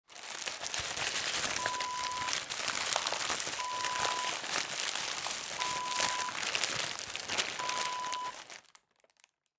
paper bag rustle

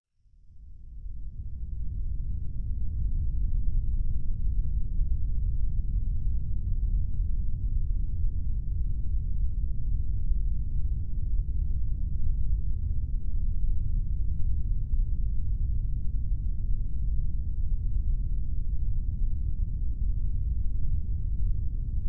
Brown Noise

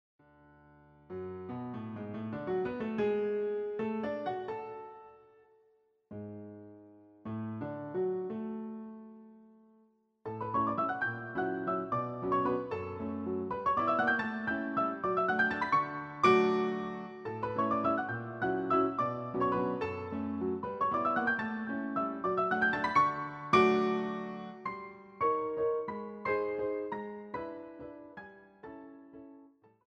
Piano roll; Papillons Op 2; Rubinstein

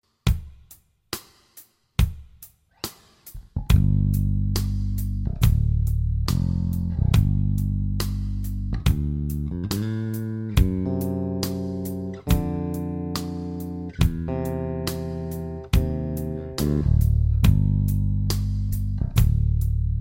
6str slow line in Gm

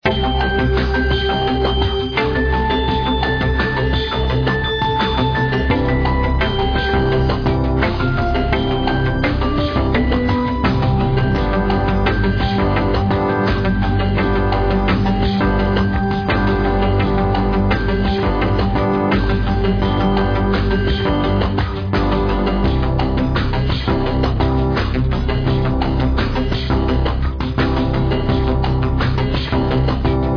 Chilled Synth Groove

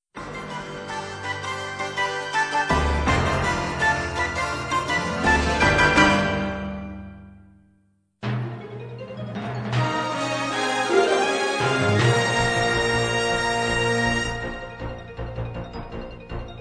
fanfare

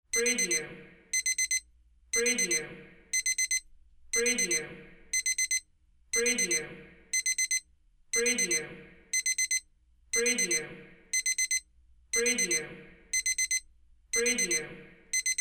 timer alarm clock

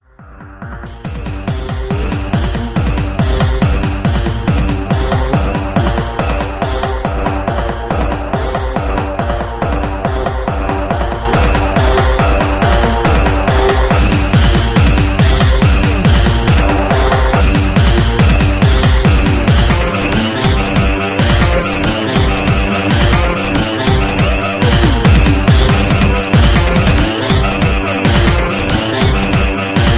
DJ Session

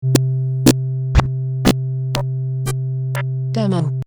Button Sounds

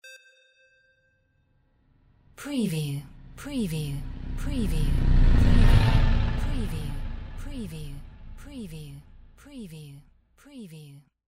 SCIFI SPACESHIP PASSBY; windy beach audio

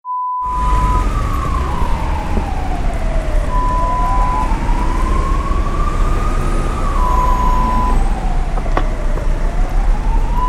London Street sound

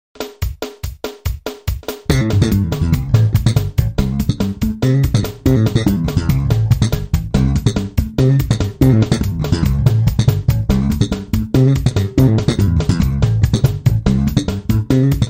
Fast slap bass in A

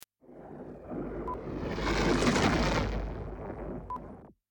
Spaceship Whoosh

